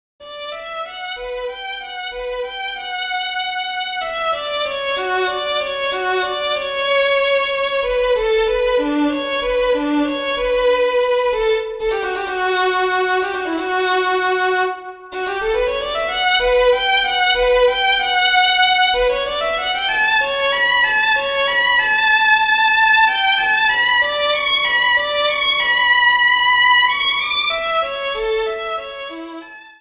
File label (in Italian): cinque preludi per violino